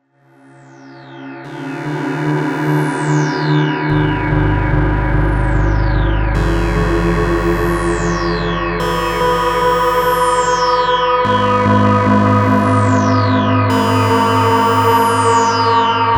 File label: Evil, Dual VDO